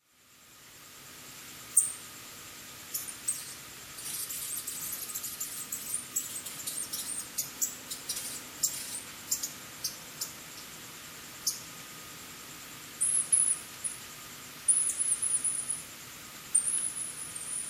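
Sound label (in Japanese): jakonezumi, Asian house shrew; itoman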